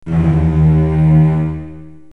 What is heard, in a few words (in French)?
synthe extrait; vieux djingle de terreur au synthé; pour les aventures du Donjon; naheulbeuk